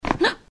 sheja so vskrikom

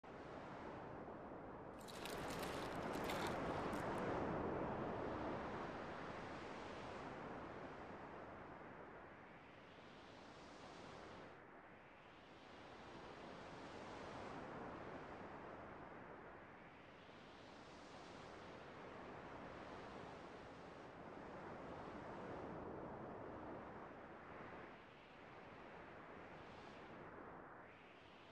Zvuk poryva vetra

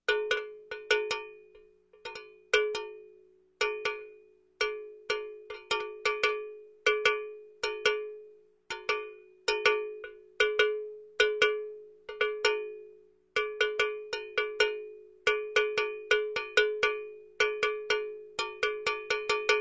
Kolokolchik u korovy zvenit; Колокольчик на шее у коровы звенит; Любой звук колокольчика, корабельного, музыкального, маленького, большого, одиночные удары

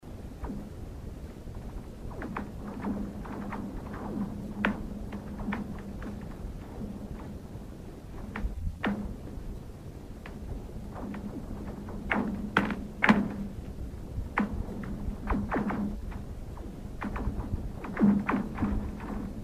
led reka; 9 gradusov

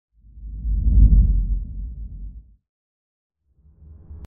nizkoe dyhanie vetra; Звук ветра; Звук ветра для перехода в видео или для монтажного перехода в аудио монтаже

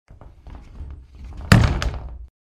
razdvizhnaja dver

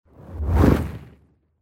zvuk dvizhenija; Короткие звуки для монтажа — для transition, движения, смены кадра, звуки для выхода эффек

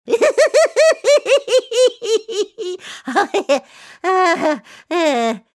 idiotskij smeh chto to skryvaet; Звуки сумасшедшего; идиотские звуки, которые издают психи в фильмах страшилках

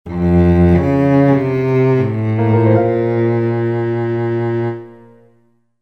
violonchel

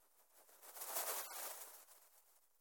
zvuk krylev babochki v polete

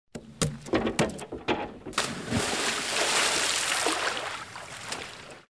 pryzhok s vyshki

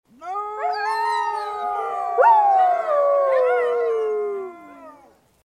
v stae

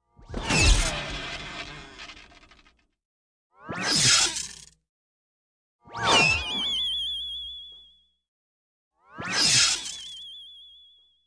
Varianty povorotov

